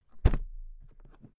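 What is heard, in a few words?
briefcase close, Büroarbeit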